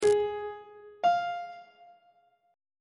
relative minor of a flat major is f on treble clef